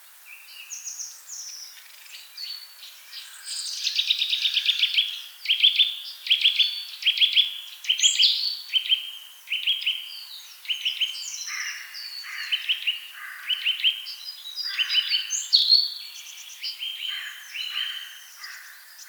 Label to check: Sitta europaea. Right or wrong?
Right